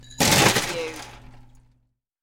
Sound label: Ice Dump